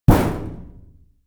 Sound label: metal barrel bang